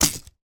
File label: impact wood bambo cane drop floor twang